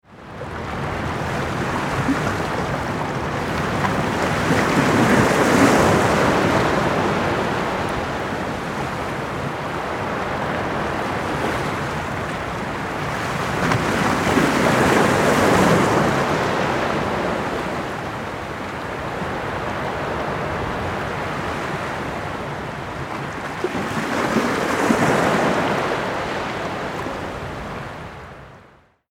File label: Moonlit Pebble Bay